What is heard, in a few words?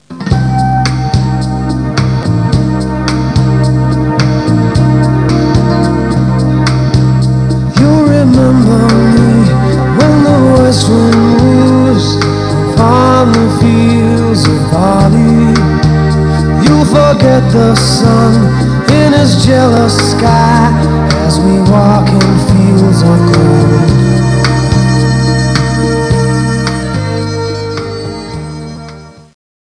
sting; Interactive Cartoon Strip!; Zika